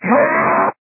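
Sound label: boar attack; kaban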